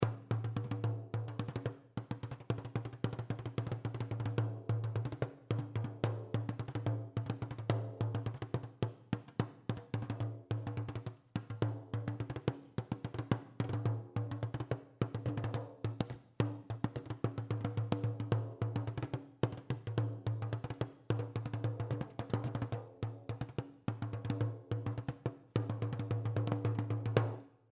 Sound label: bodhran